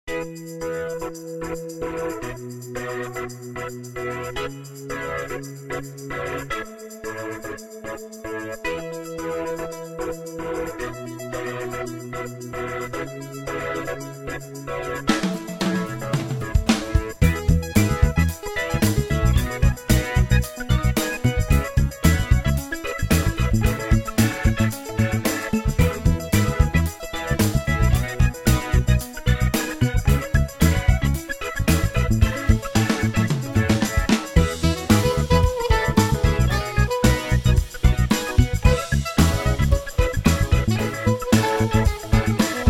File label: 4 Chords